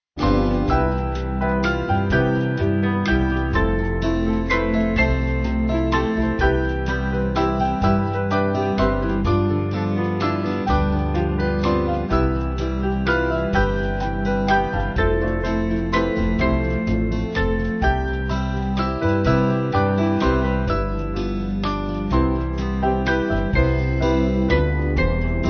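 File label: Band, Music Backing; Sweet Hour; Bradbury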